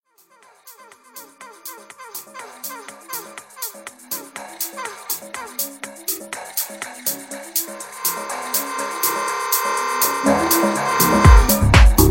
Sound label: techno live sets